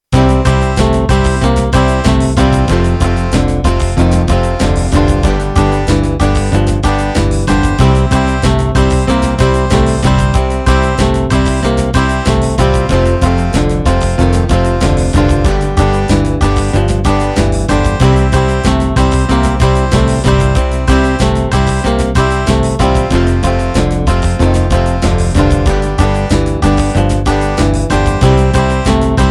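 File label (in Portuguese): instrumental; folk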